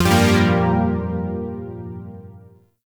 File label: Shawzin Phrygian Chord J; Chords; Warframe; Zariman